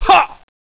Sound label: pain